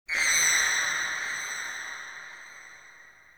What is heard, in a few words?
Interior Texture High Pitch Screech, Infested Eris; Ambience; Misc Indoor; Warframe